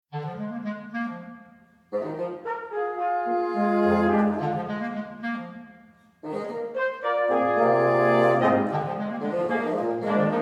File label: Dawn Chorus